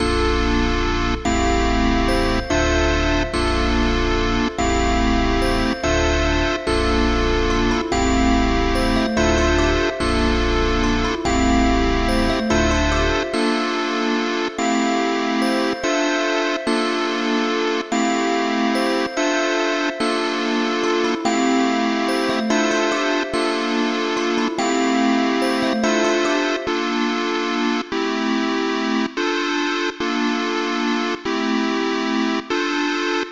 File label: 144 BPM D Min, Melody; Cymatics; 2022 Melody Collection; Wavy